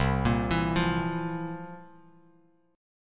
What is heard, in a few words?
C 11th, C, down, Bass Standard; C11 arpeggiated